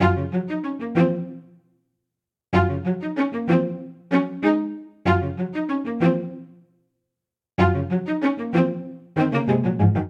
Ostinato Enchanted Gb Major 95 bpm, String Quartet; MIDI Clips; Ableton; Spitfire Audio